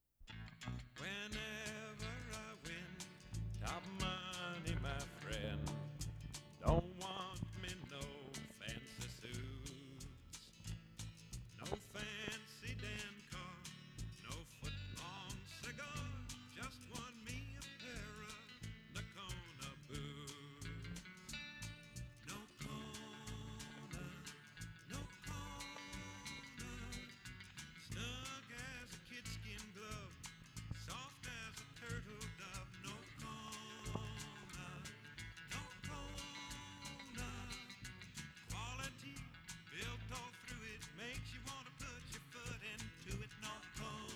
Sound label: BOOT JINGLE; NOCONA